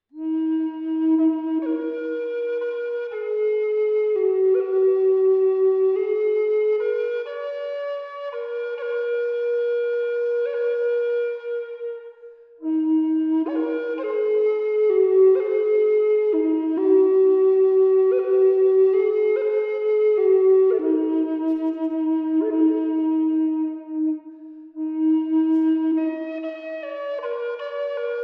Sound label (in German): Haselnuß E 432Hz Ast